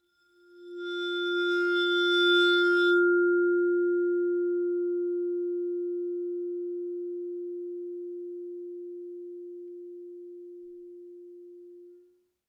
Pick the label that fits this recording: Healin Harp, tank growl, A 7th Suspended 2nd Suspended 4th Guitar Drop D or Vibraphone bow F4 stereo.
Vibraphone bow F4 stereo